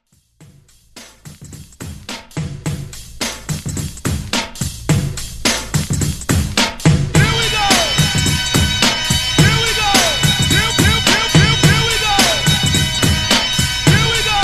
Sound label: Party Break To House Transition